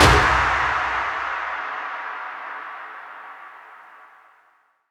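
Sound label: percussion FX, Dance Drums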